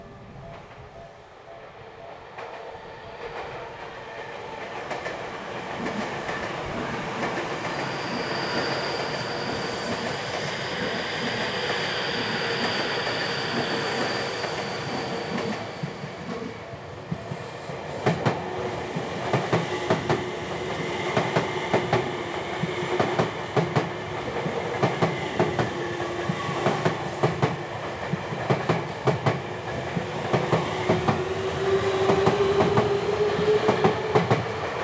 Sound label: train